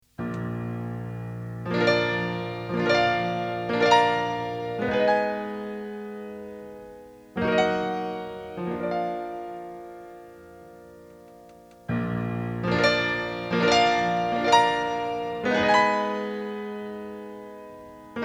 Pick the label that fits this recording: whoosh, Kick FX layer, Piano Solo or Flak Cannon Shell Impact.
Piano Solo